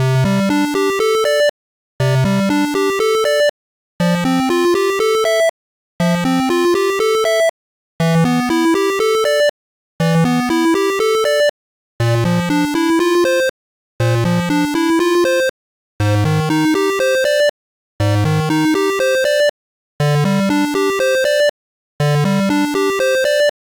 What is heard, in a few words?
8bit tabidachi junbi Loop